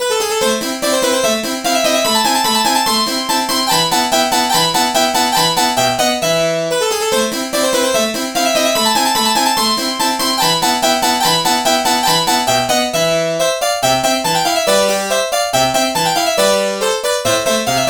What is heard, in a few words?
harpsichord; SpectMorph Evaluation #4